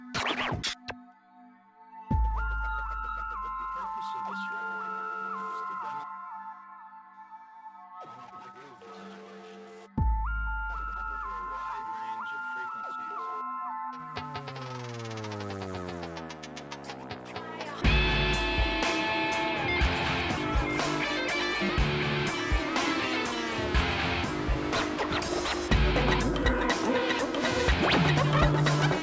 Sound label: Pulsar Tone